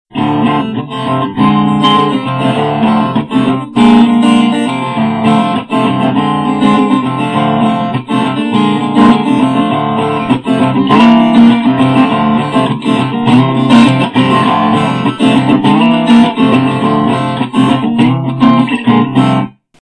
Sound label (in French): accords